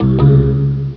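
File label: flag return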